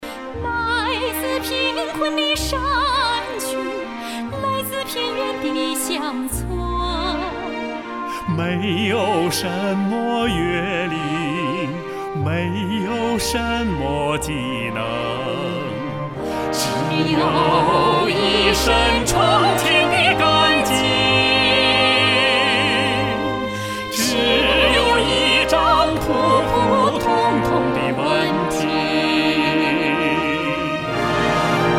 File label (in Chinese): 原创歌曲片段